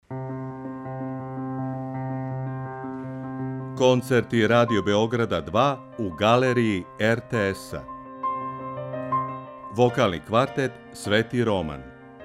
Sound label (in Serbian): Dzingl klavir